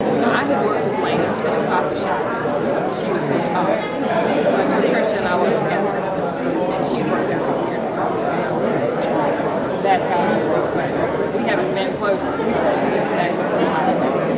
Crowd Noise

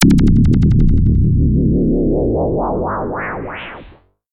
Interdimensional

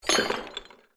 Clunky Metal Noise

